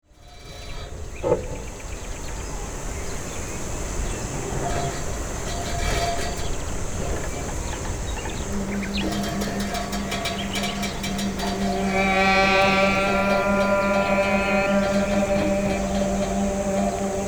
sirènes, extrait des performances improvisées; Les quatre pistes sont jouées en même temps (les quatre musiciens); colline du Saut du moine (Jarrie)

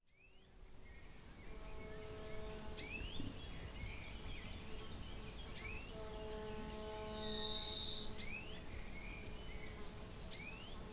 corne brume, Cornes de brume dans la plaine de Reymure; Enregistrement : Théo Marchal

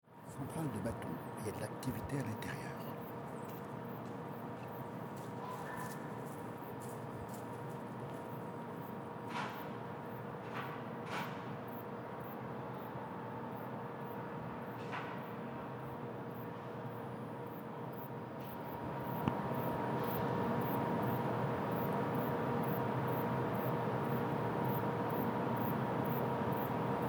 centrale baton, les ondes de l'eau

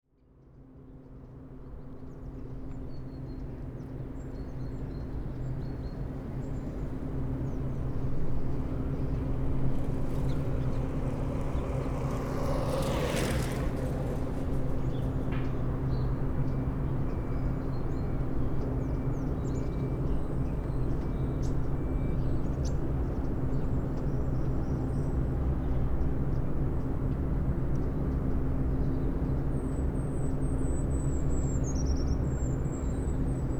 Ferme Urbaine; les boucles de l'isère; voix d eau

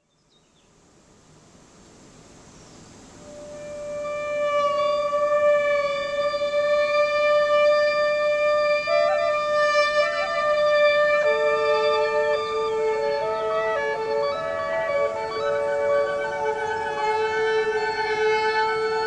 sirènes, extrait des performances improvisées; Deux pistes jouées ensemble : le violoncelliste (Alessandro De Cecco)

